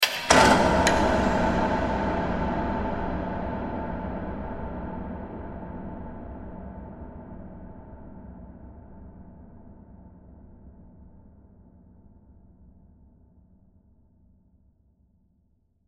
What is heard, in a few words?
Dungeon Cell Door Processed Reverb, Horror; Scary Soundscapes; Blastwave